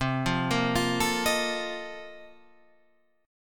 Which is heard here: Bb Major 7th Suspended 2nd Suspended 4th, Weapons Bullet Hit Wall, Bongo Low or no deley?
Bb Major 7th Suspended 2nd Suspended 4th